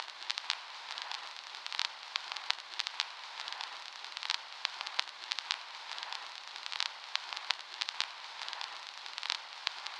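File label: Vinyl Crackle Gramophone; the outbound ghost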